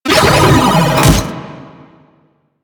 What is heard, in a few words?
Proto Infested Mutalist Speaker Start Scream; Warframe; Abilities